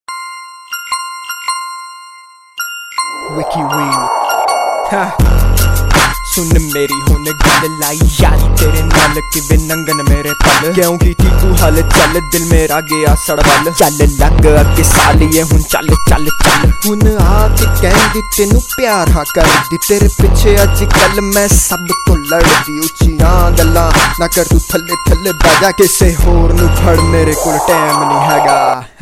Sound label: Punjabi Rap